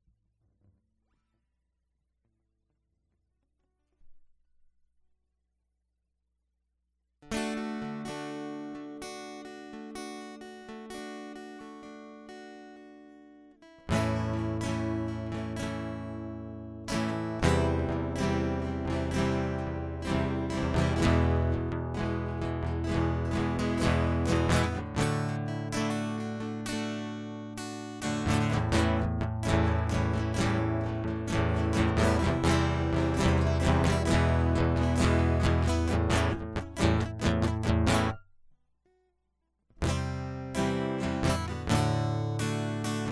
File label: Coming Clean Guitar Loop